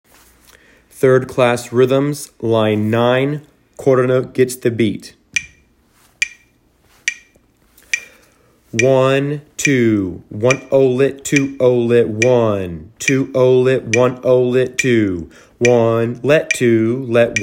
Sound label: rhythms